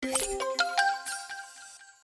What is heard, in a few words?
level up